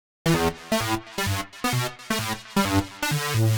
130 E, uk garage, Synths; 130bpm Lines n Loops

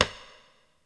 STEREO DRUMS

